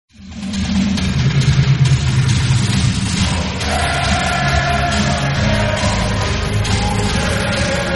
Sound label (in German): bvb torhymne